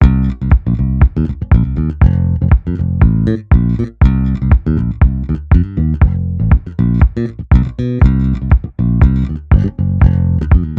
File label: bass